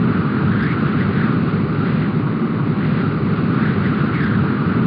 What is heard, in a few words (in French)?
tempete